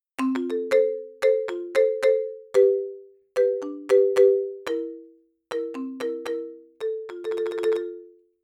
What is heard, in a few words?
Marimba